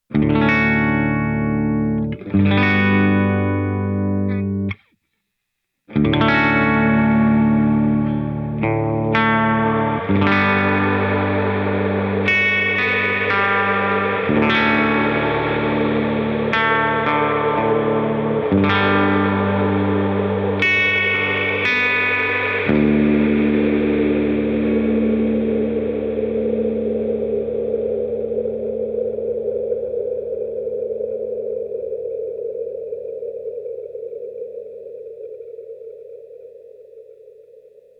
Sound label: Wind Noise; reverb; Ghost